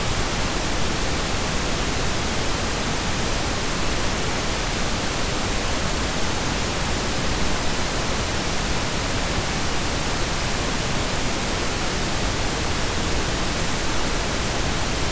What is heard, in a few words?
Pink noise